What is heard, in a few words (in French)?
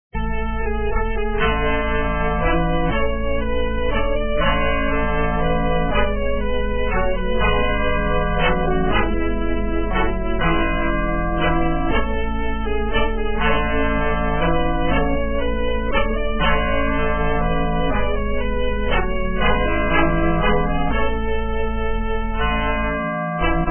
fond sonore; dungeonhack